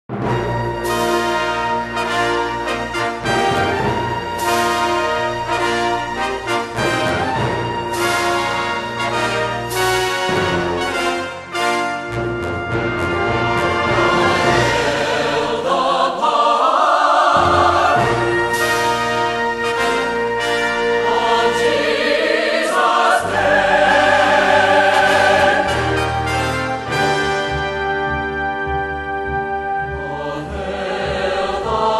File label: Chorale With Orchestra, All Hail The Power